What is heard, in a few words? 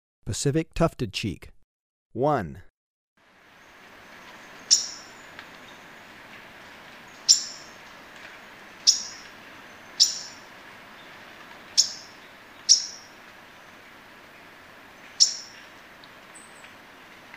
Pacific Tuftedcheek, Birds of Ecuador; S. America